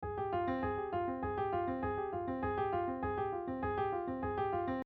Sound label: Imitation Intro; film’s titles